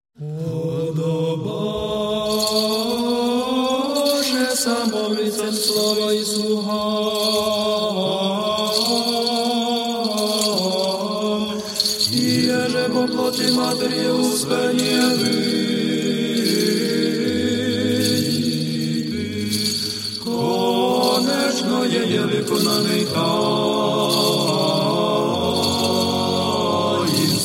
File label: UKRAINIAN; UKRAINE